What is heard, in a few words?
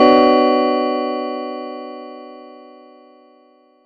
Chord; ModelCycles